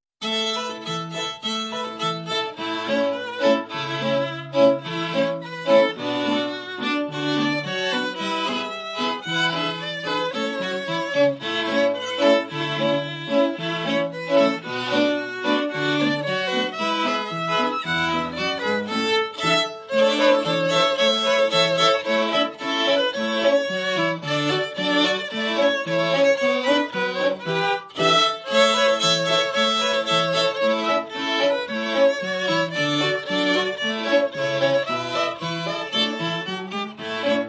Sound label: Jingle Bells Trio